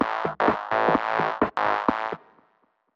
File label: Booty Bass, Sting